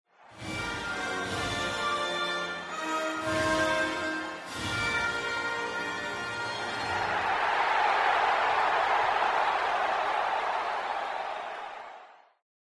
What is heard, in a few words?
Ambient Prerace; NASCAR Thunder 2004